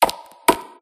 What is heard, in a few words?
Move; Ruu Goblin Quest